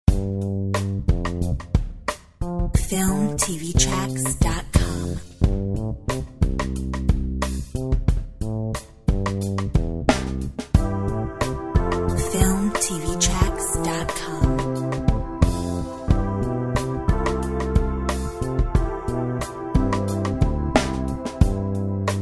Drum bass; Filmtv-tracks

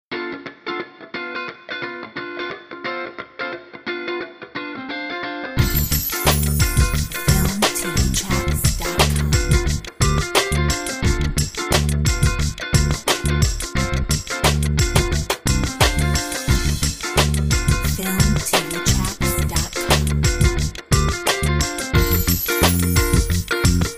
Funky ride

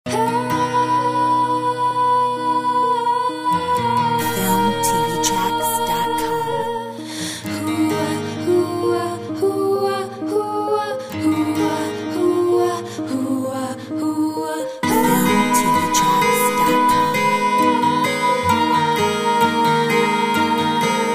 Desert cafe

